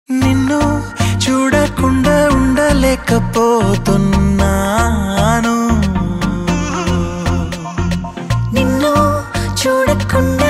Promo BGM Ringtone; Check; Telugu

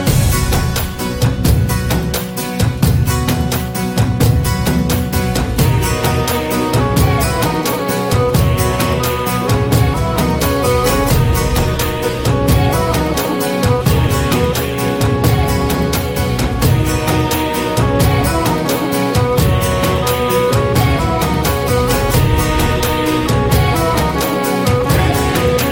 Drum Beats; Rudhramadevi; Telugu

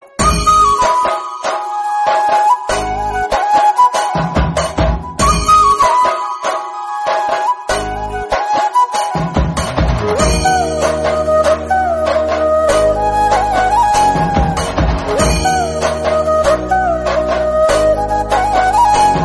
Kuzhal Melam Ringtone; Tamil

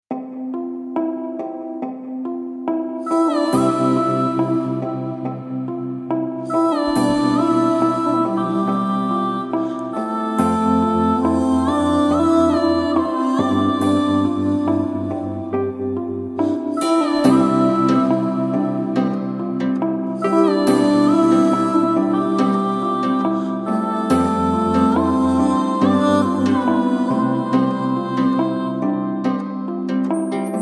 Starting Music Ringtone; Dear Comrade; Telugu

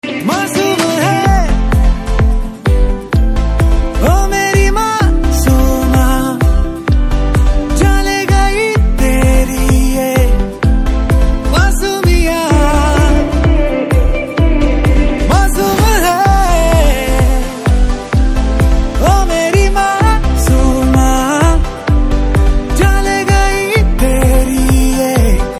Ringtone, Hindi; The Sky Is Pink

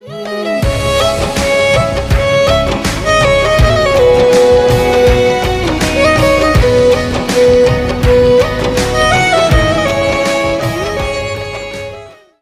Instrumental

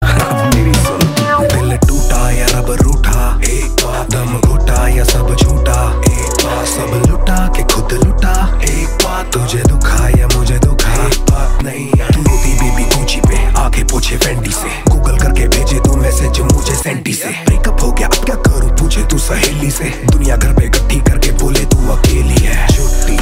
Naiyyo Rap

